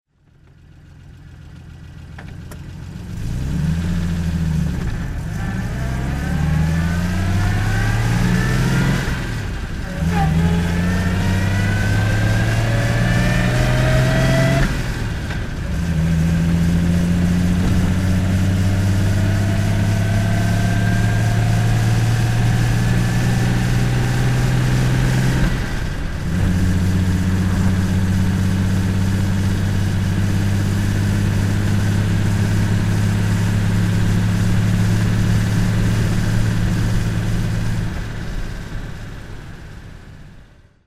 wolseley hornet special driving

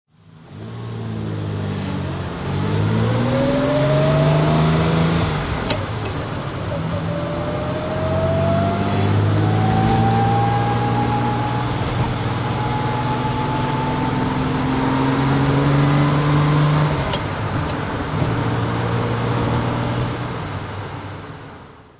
ferrari 275gtb drive

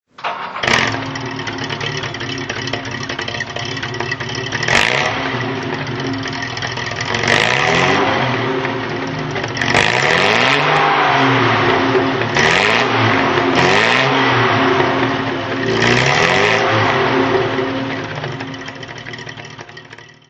jaguar xk 120 dhc throttle

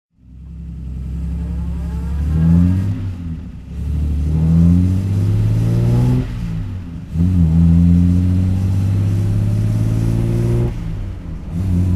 austin healey mkII 3 carb drive

